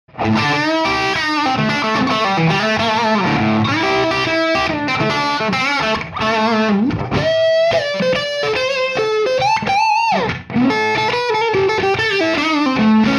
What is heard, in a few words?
marshall 100w; m75 lp